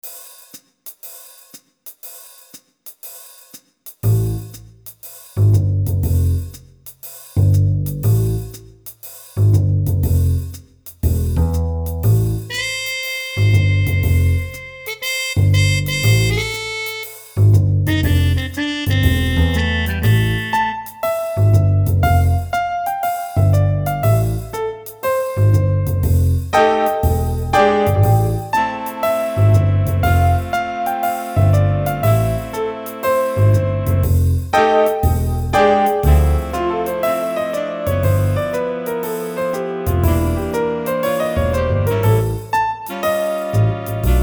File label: cats, jazz